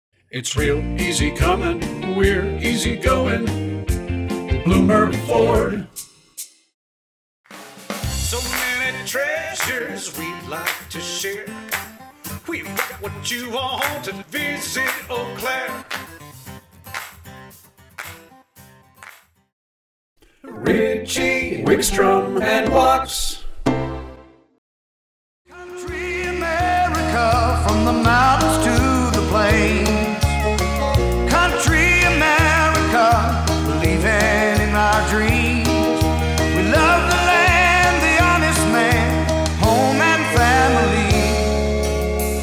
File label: Web Jingle Compilation